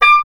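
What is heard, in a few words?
oboe, wind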